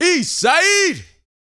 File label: VOCAL F; ILIO